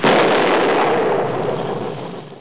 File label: fire; netpanzer